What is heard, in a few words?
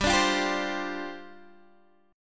G 7th Suspended 4th G down Guitar DADGAD